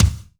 Kick Drum

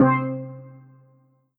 Ensoniq ZR-76 Steel Drum C3

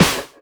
Ensoniq ESQ-1 Snare

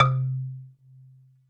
E-Mu Proteus FX Marimba C3

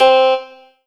Yamaha TG500 FI Dulc D C4

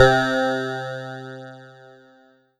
Roland D-20 Funky Clav C3

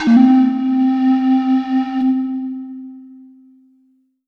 Ethnic Flute Triplet C5

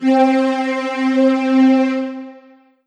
Kawai K5000W Strings C4

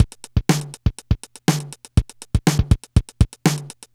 Yamaha PSS-280 Funk

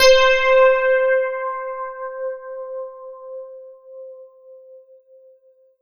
Yamaha TG77 Dream Rhodes C5

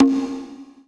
Kawai K11 High Conga

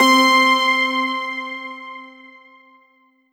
Roland JV-2080 Prefab Chime C4

